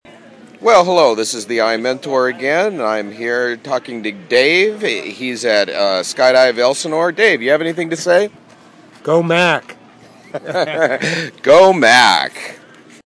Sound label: skydive